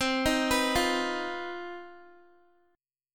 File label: C Minor Major 7th Double Flat 5th chord, Guitar; arpeggiated; CmM7bb5